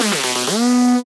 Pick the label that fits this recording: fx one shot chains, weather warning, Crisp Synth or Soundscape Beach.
Crisp Synth